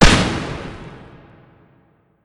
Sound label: revolver; Weapons; Barotrauma